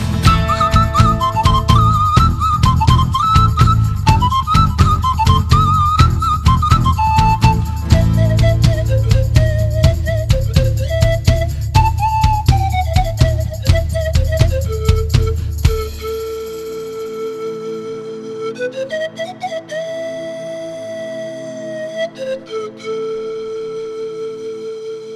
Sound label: Romanian Flute